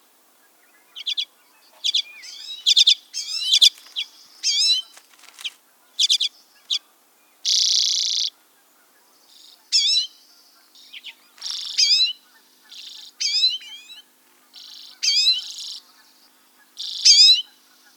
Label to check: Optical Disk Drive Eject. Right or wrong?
Wrong